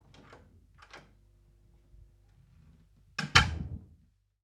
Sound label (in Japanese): ドア開閉音